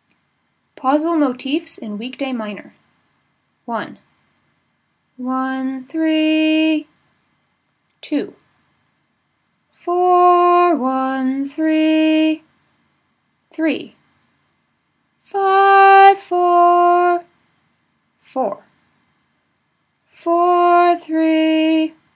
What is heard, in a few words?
weekday minor pausals, nusach